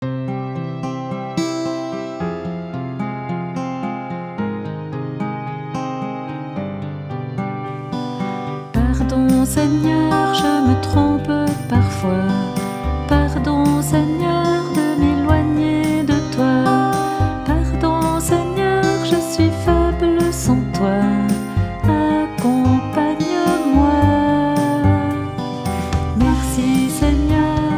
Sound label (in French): Chant Pardon Seigneur